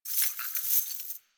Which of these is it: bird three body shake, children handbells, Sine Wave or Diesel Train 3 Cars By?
bird three body shake